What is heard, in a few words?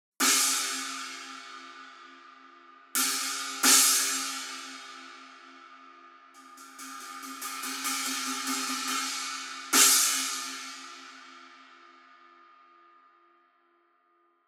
Resonant China 16 Rock; Masterwork 16 Resonant Rock China